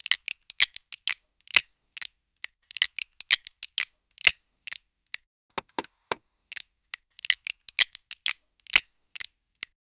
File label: loopable ketchup bottle, household